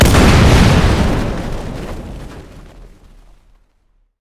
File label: infernobomb; LoL; ziggs snowday